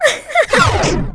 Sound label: attack